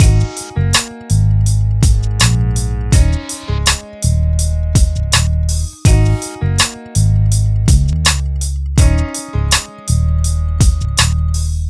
MIX, Hip Hop and R&B Drumloops; Best Service; ProSamples vol.01; AKAI

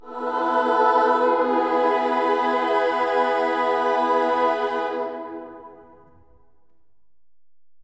AMEN, AMENS; Spectrasonics; Symphony of Voices; BC AMENS

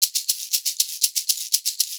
120 SHAK, Percussion Loops

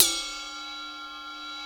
RIDE BEL, ELEC.DRUMS; Crypton - DrumBase5000

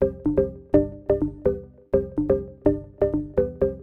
Organ chops (125BPM), retro house

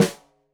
Snare Stereo, Drums; Deeply Sampled Drums; SMDrum

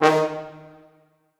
D#2 L, Orchestral Brass

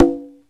CONGA SET; OMI - Universe of Sounds

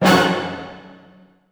C MIN FF, orchestra hits; Sound On Sound - Peter Siedleczek's Orchestra

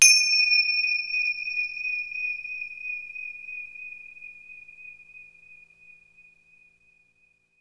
FINGER CY R, Synclavier Percussion; ILIO; Synclavier Percussion 2; World & Orchestral

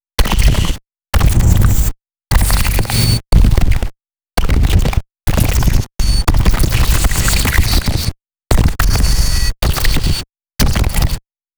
water bass

